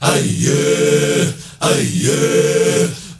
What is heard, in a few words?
MALE, HUMMERS; Spectrasonics; Heart of Africa 2; M.HUMMERS